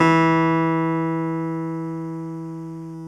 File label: KEY X Hohner Clavinet; Clavinets; Club-50 - Foundations